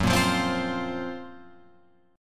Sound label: Gb Major 7th Flat 5th Guitar Open F; GbM7b5 strummed; JGuitar